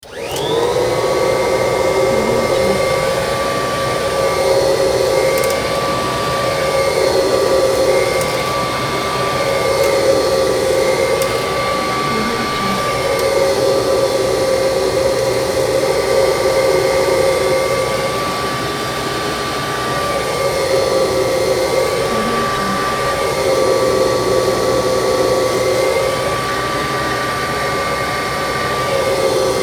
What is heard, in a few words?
large vacuum cleaner movements